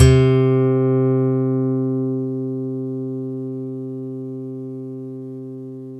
SLIPPICK; MORPHEUS

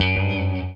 ROKABEND, Guitar & Bass; 90 s Sample CDs; Quantum Leap - Guitar & Bass

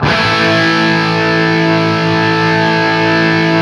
5TH A looped, Guitar; East West; Steve Stevens Guitar Samples Collection

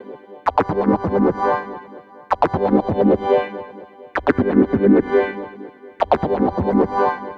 Progressive Synth, Trance

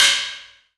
noise, FX & ATMO, ALARMS & ALERTS; Techno Trance; Techno Trance Essentials

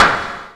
TICTALK DRUM

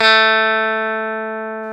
GTR TELE, 60`s Combo

